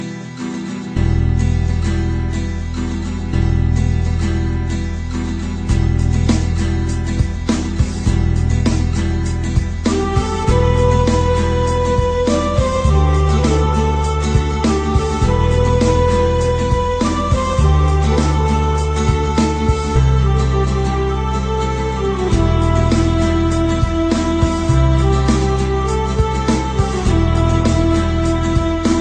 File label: instrumental